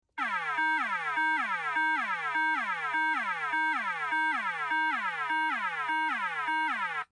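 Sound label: POWERCALL, emergency vehicle safety warning; SUBTONE; 911 Signal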